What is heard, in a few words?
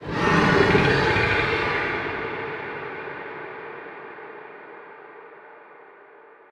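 ambience unknown creatures big snake; Sfx amb unknowncreatures bigsnake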